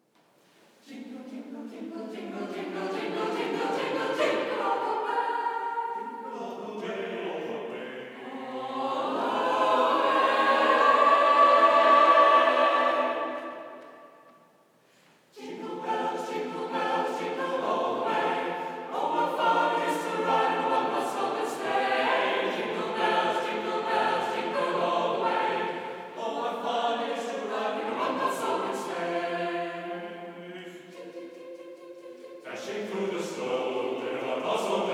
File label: Jingle Bells; Rundetaarn2015